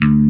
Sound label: ROCK BASS D3; E-MU Formula 4000 Series Vol. 5; Protozoa; Proteus1 Instrmt